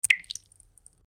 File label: dripping pipe